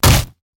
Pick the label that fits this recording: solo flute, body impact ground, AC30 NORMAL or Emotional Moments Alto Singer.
body impact ground